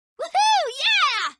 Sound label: Woohoo Yeah; Tails